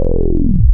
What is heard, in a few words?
VV3320 PWM Res Sweep, wavetables; Animoog